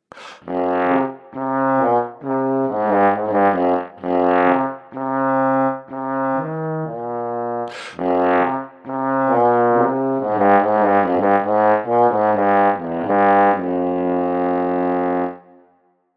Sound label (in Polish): Serpent